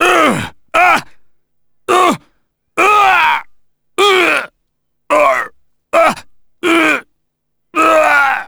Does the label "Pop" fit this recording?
No